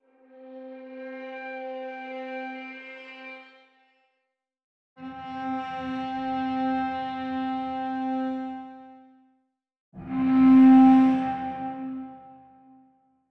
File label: Strings, monosounds